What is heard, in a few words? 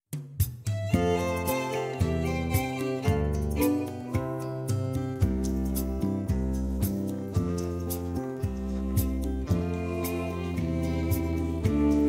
no vocal; Teidi beag alainn